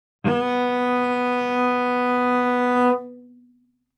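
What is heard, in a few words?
Cello arco fortissimo sul G B3; MIS Pitches - 2014; Strings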